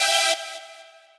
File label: saw